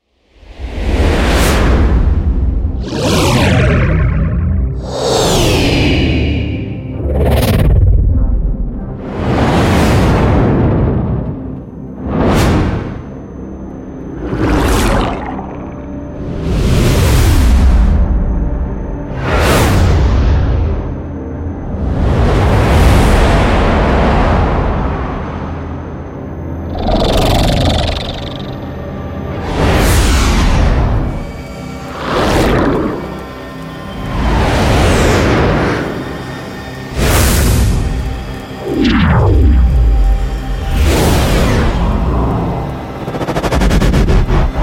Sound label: Distinct Whooshes; Rescopic Sound